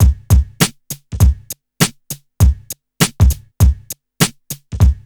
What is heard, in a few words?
RNB 100 BEAT; Burning Grunge Hip Hop; USB Soundscan vol.34; AKAI